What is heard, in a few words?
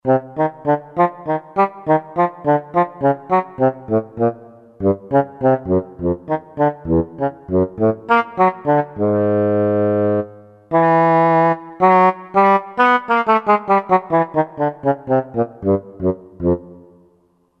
Bassoon, Roland JV-2080